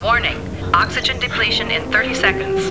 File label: oxygendepletion, sirens; alarms